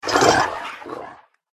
dog attack voice bottom

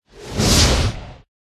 Fire Spirit A up

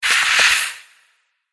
scorpion attack voice up

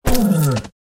Tiger Wound

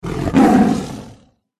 panther attack voice bottom

